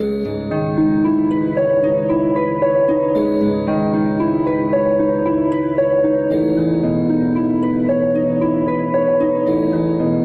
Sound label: strings and flute, sad loop; loops